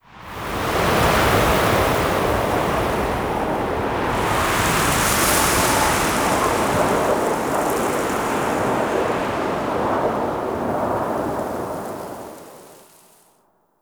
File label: Exterior Wind Gust; Duviri Murmur